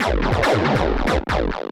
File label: Industrial 140